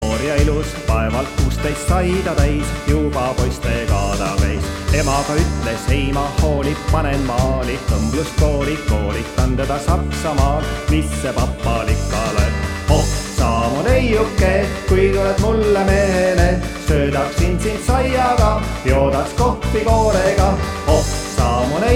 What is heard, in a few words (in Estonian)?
polka; riidaja